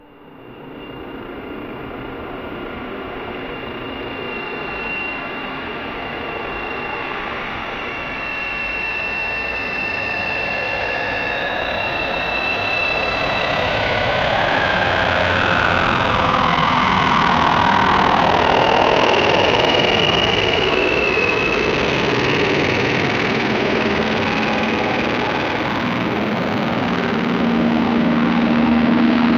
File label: helicopter; kobe bryant